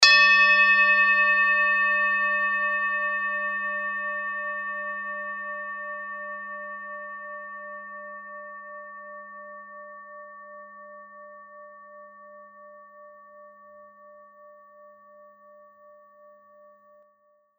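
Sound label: tibetan bowl uranussaturn, G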